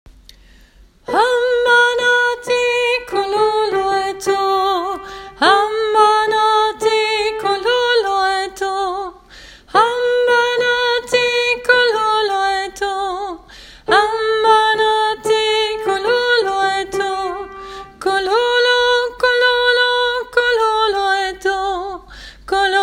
sopran; hamba nahti 2.; åfjord

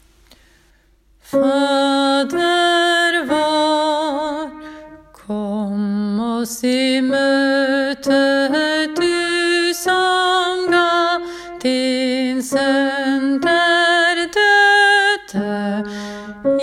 hymne bass; åfjord

